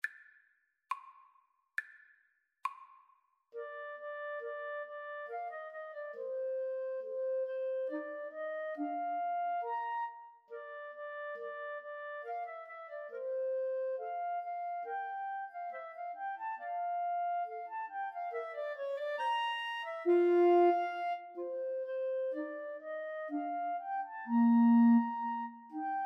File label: Clarinet Trio